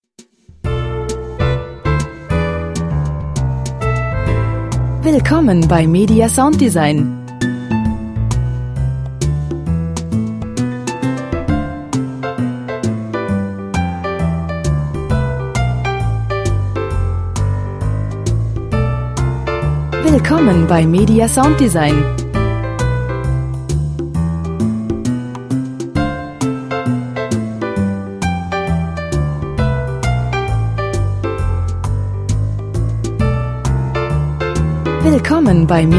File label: jazz jazzy bass